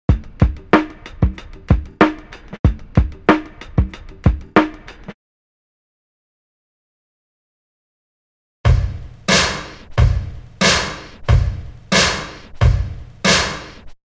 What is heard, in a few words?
Drum loop; BEST OF DRUM BREAKS 30